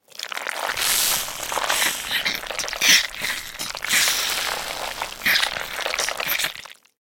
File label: achatina idle